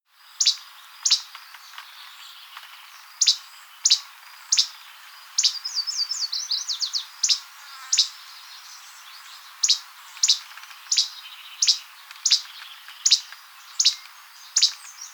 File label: Least Flycatcher, Empidonax minimus; flycatchers